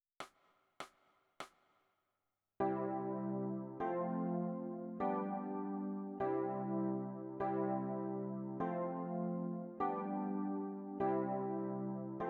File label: E Piano